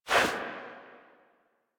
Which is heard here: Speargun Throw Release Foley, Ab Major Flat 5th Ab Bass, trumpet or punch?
Speargun Throw Release Foley